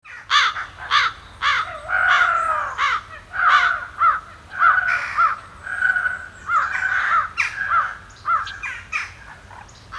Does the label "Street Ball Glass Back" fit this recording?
No